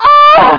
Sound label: Female Death